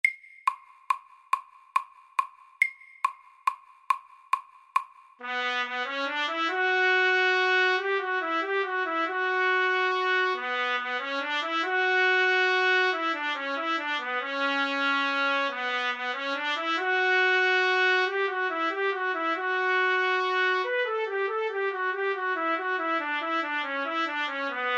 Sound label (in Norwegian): Etyde i Ciss moll FORT